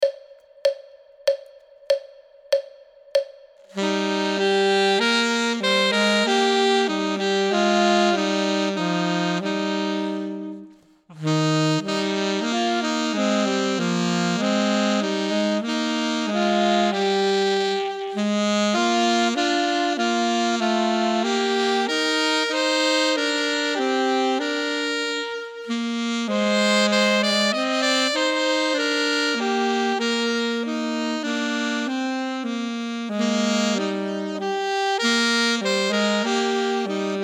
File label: duet; Ten Easy Duets